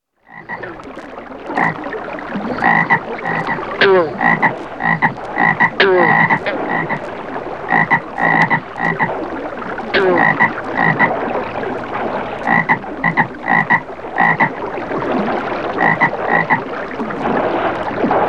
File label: Frog In Swamp Eating Flies; Halloween Sound Effects